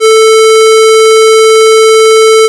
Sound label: square a3